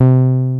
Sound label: 303 BASSES; Pro-Rec - Synthsonic